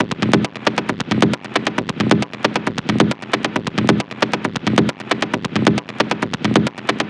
Perc Loop 135, Techno

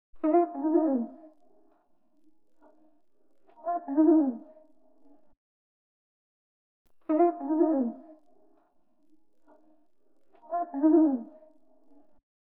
Vocal Loop, 140 BPM C# Min; Aqua; Vocal Loops

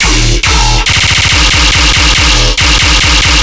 Bass Loop D# 140BPM, Dubstep

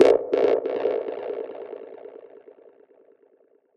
Squelch A 140 BPM, Psytrance; Ghosthack; Psytrance Visions Volume 2

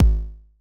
Kick, Deep House; Ol' Skul; Ghosthack; Advent Calendar 2019

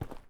Footstep Stone Light

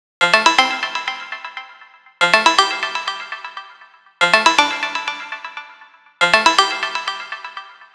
Loop Synth 120 F; Deep House; Ghosthack; Advent Calendar 2019

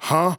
Dry Chant "Huh"; Cymatics; Euphoria Vocal Sample Pack; Chants

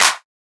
Clap, Techno; Techno Sounds 2024

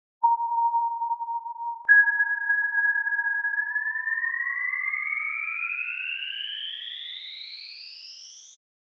143 BPM D# Min Riser Pluck; Melody Loops; Loop Stems; Cymatics; Hyperbass Pt 1

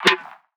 Percussion, Hip Hop, Drum One Shots; Cymatics; Cobra Hip Hop Sample Pack

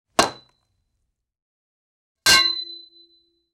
Metal Pipe Impacts; Fairlight Sound Lib; Good

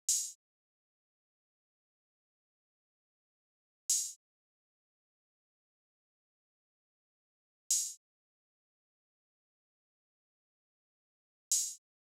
Drum Loop 126 BPM Open Hat; Drum Loops; Loop Stems; Hip Hop; Cymatics; Casa Drum Loop

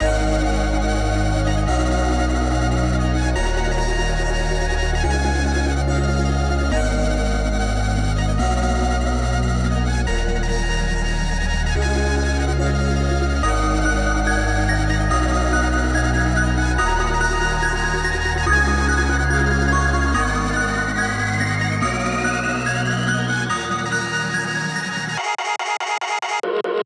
143 BPM D# Min; Melody Loops; Hyperbass Pt 1; Cymatics

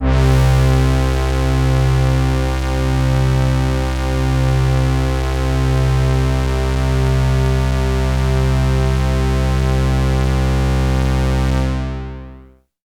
Prophet 5 LEAD Brass - C; One Shots; Cymatics; Fantasy Synth Sample Pack

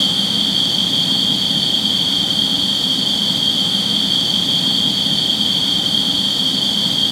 Texture Loop, Techno; Ghosthack; AC2024 - Day 1 - Techno Sounds 2025

